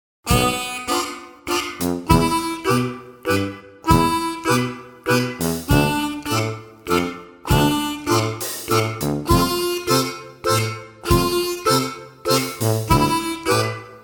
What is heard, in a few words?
waltz